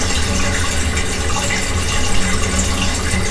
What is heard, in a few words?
plumbing run loop; spacial loops